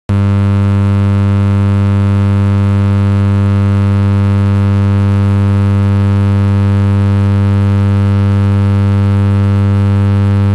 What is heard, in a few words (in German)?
Leuchtstoffroehre